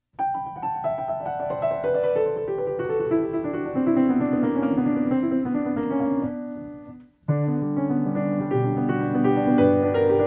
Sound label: piano